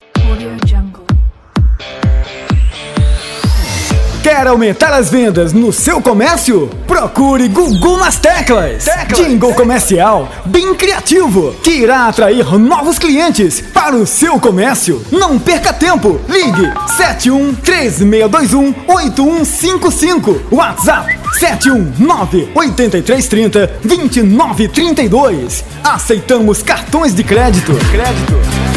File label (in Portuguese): spot jingles comercial